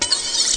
CLEAN SWORD; Wonder's Greatest Toyshop on Earth

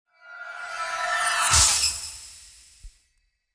Device Fail Connection; Planetscape

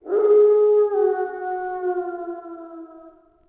animal; DAoC; Toa

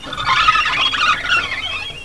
Wall Squeek; Casady & Greene; Crystal Crazy Demo; Bonus Wave

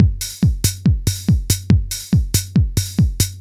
drums; flash tutorial

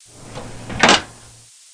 File Cab

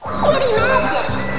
KITTY

